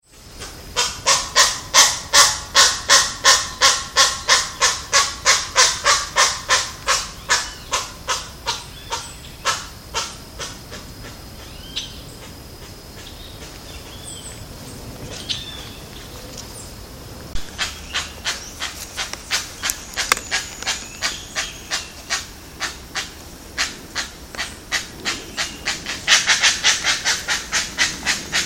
Penelope superciliaris; Jd Botanico SP

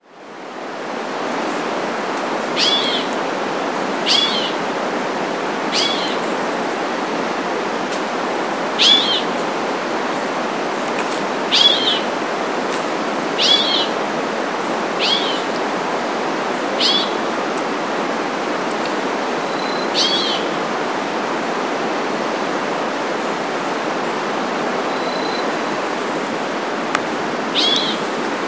Cacicus chrysopterus; perto do rio; N Cunha

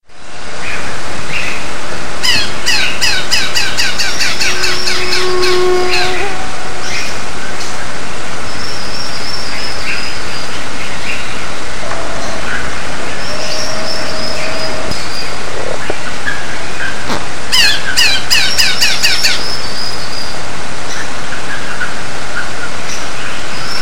Mionectes rufiventris; Itatiaia NP; Silveira

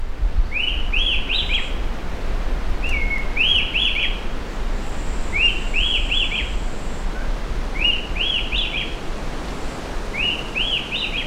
Attila phoenicurus; Faz Mont Verde

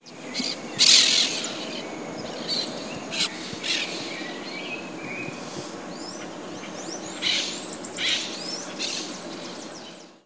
Aratinga aurea; golf; I Comandatuba

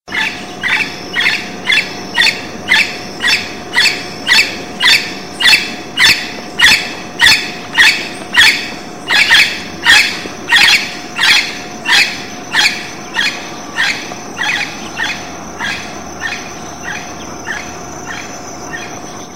Amazona amazonica; Jureia SS SP Brazil; Silveira

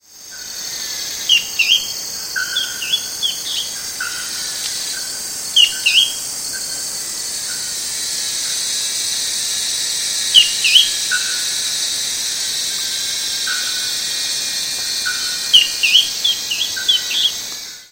Drymophila ferruginea; sitio cachoeira; Mogi das Cruzes SP

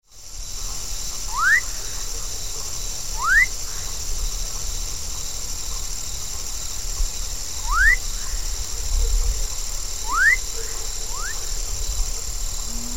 Leptodactylus fuscus; Ibiuna; SP

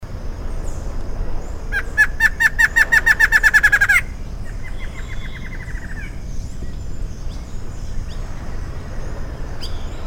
Thamnophilus palliatus; Pr Tangua; Ang dos Reis

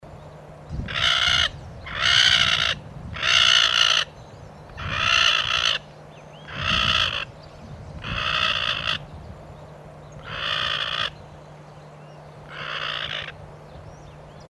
Rallus longirostris; Guarau; Peruibe; SP; Silveira

